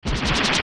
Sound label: Bongo Bongo Shockwave; zelda; OOT